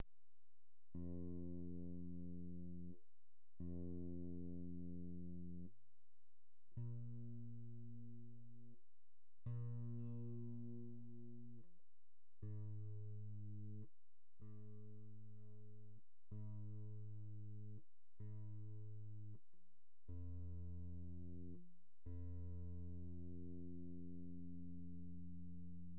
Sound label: guiter